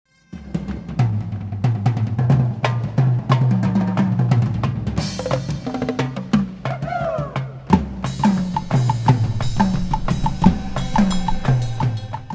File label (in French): solo de percussions bagad; cesson